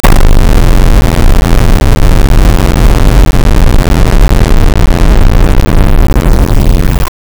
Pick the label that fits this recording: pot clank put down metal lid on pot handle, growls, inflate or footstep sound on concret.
footstep sound on concret